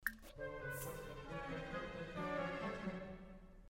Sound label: mood uncomfortable; sims 4